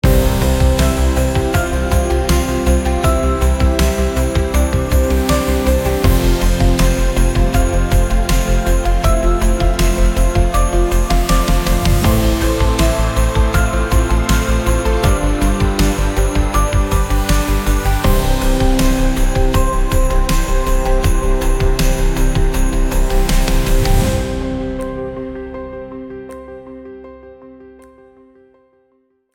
80 bpm Christmas Orchestra Firework; 30 sec; ai social media music

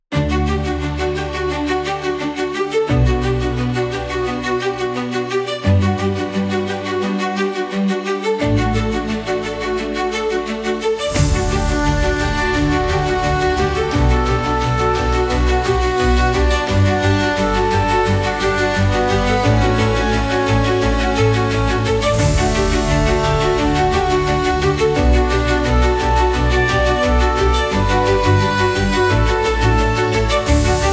87 bpm Orchestra Trumpet Melody; 37 sec; ai social media music

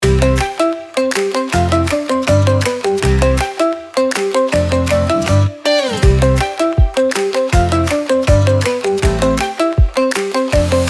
80 bpm Handclaps Guitar Pop, instrumental; 64 sec; ai social media music

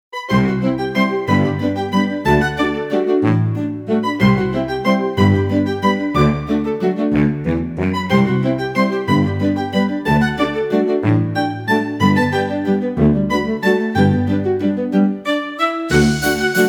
Minuet Dance; ai social media music

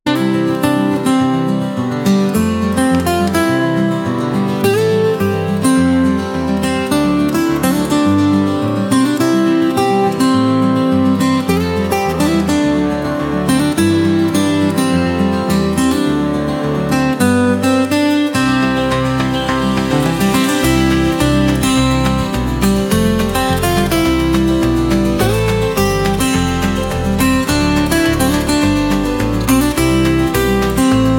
105 bpm Newborn Guitar Melody; 44 sec; ai social media music

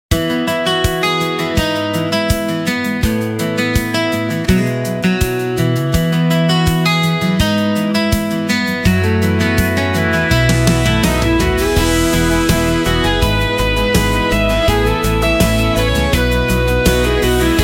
82 bpm Guitar Melody Drums; 89 sec; ai social media music

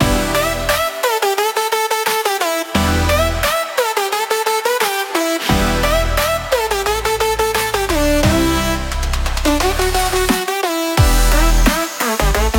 88 bpm Squeak Beat; 71 sec; ai social media music

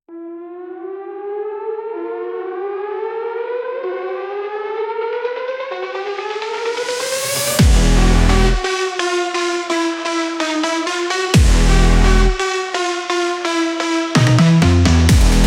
Techno Alarm; instrumental; instrumental ai music

